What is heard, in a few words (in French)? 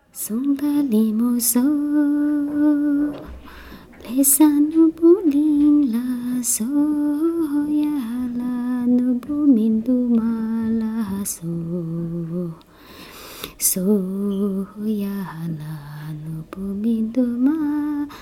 Chant; ABBAYE